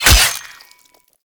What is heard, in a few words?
Melee Axe Impact Flesh; Weapons; Impacts; Axes